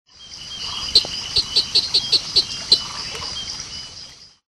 Boophis picturatus

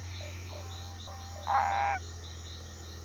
Boana paranaiba

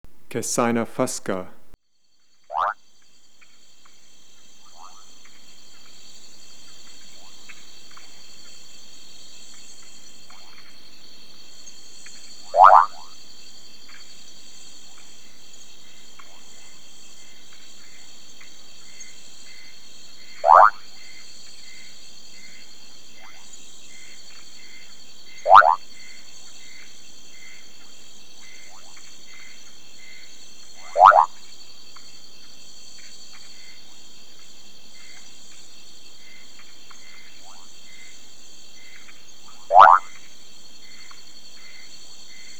Kassina fusca

